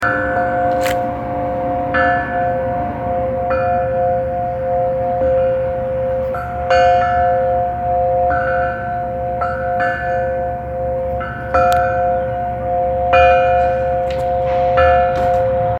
sound silence

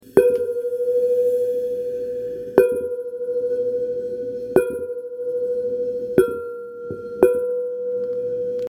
redtone

